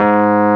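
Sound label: WURLY NO, Wurlitzer electric piano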